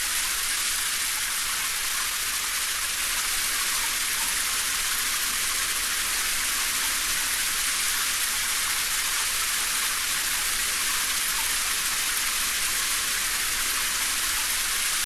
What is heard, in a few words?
Shower; sunrider mask of arcadius